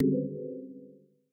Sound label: Error Window; Game rip; MiSide